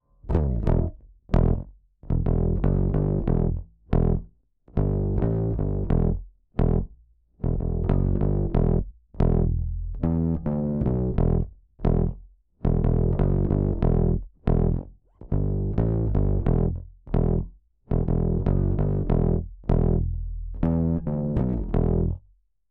basse avec; omnitec

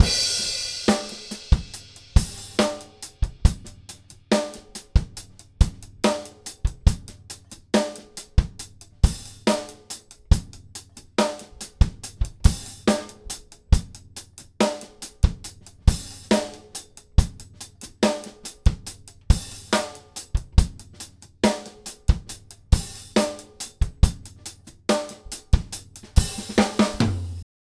batterie sans effet; timeless 3

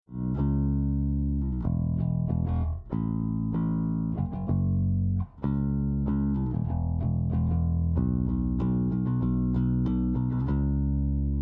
basse avec; overloud comp g

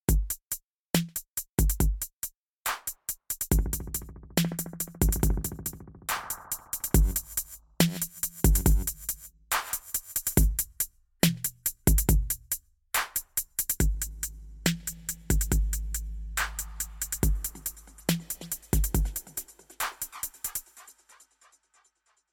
Beats 40 Pourcents Effet; Eventide Fission

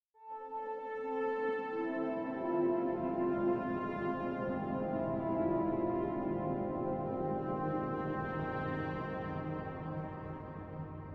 grainspace synthe

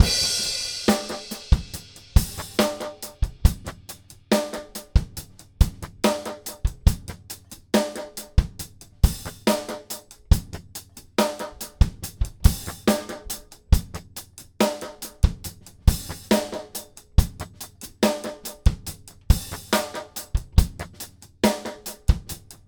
batterie delay; timeless 3

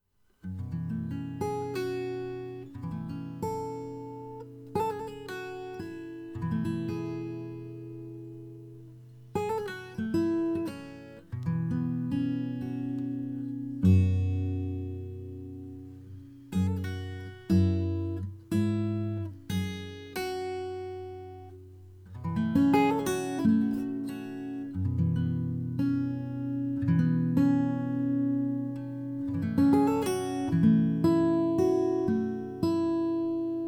blue yeti guitare acoustique

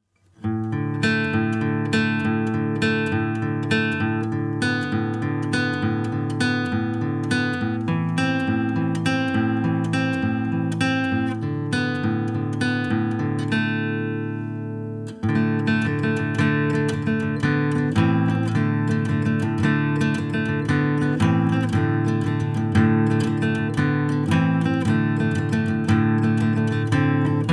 guitare acoustique avec; overloud eq84

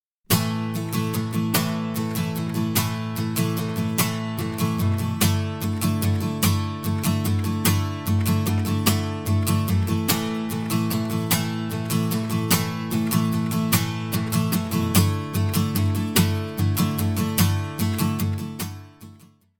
Guitare Plus Transitoire; Eventide Fission

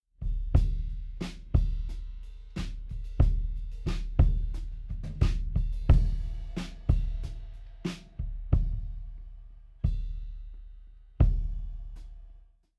kick avec

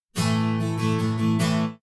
Sample Guitare Acoustique Tonal; Eventide Fission

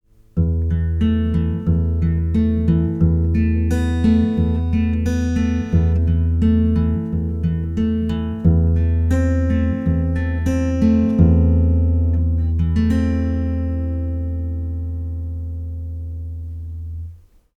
FetHead phantom sans

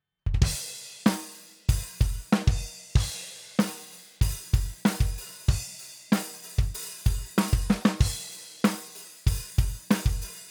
drums avec effet; stoneq 4k

